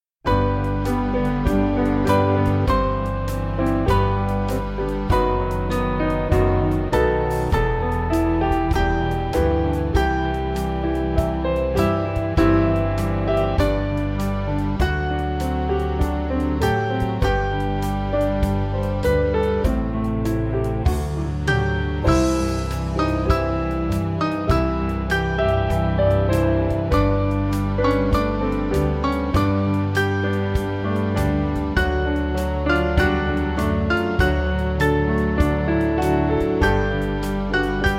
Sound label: We Worship And Adore, Band